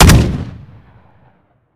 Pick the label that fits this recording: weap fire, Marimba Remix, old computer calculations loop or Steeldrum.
weap fire